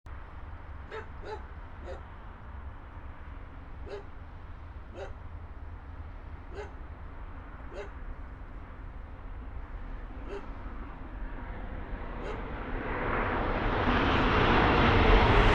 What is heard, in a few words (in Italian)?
Treno; Eigenmike; ComunePR; NuovaStradaF